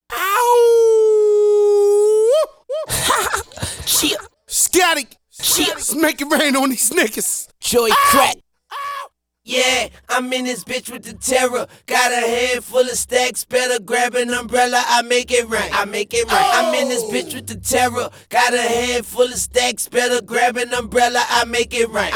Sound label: Acapella